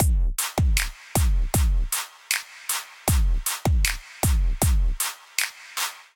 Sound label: Underground Rush Kit groove 130bpm